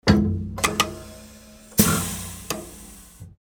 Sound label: machine